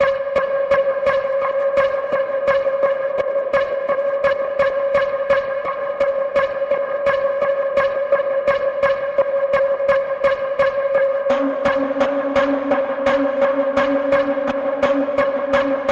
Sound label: Forewarning Pulse